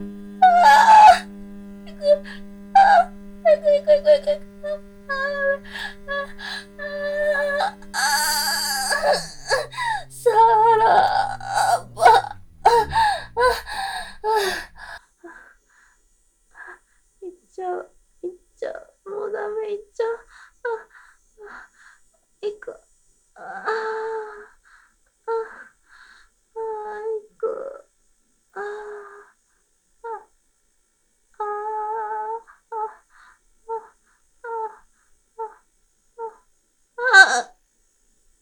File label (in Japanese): 絶頂集; 姫おさめ絶頂集